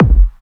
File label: Kick, Drum Hits